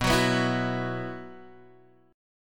F sharp 7th Suspended 4th

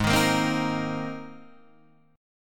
Ab Major Add 9th Ab Guitar Open D

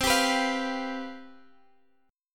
C Major 7th Suspended 4th, Guitar, Standard; strummed; x,x,10,12,12,13; capo=2

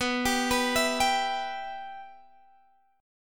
B Suspended 4th Sharp 5th, Guitar, Standard; Bsus4#5 arpeggiated; JGuitar

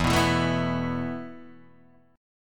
A Suspended 2nd E

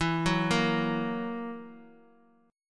E Suspended 2nd Flat 5th E down

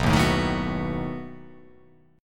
B 7th B down

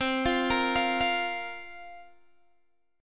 C 7th Suspended 4th, Guitar, Open G

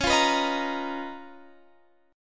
C sharp 13th C sharp, Guitar, Standard

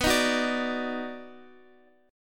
Eb Minor Major 7th Sharp 5th B, chord

